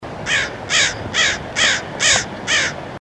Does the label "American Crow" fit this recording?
Yes